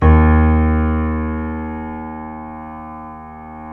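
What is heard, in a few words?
keys, YC7 piano pp; Rhythm Section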